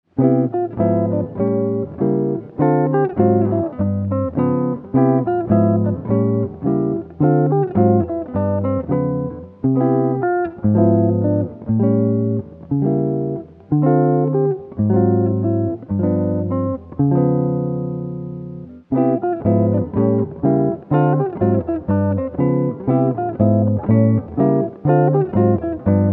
S Guitar

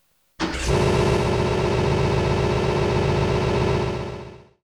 car engine start

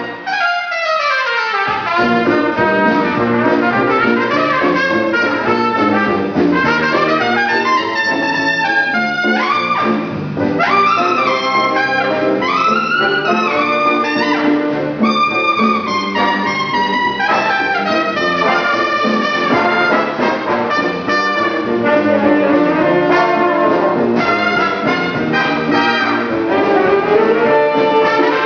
atom jazz

